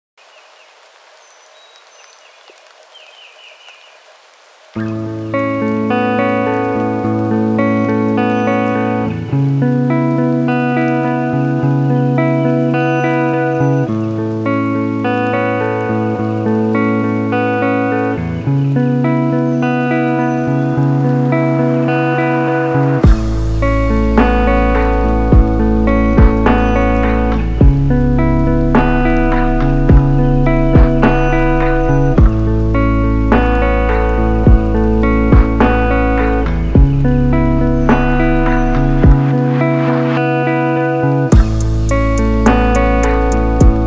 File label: Lo-Fi Track